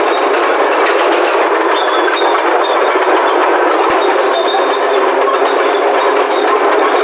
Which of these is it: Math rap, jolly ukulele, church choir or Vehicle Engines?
Vehicle Engines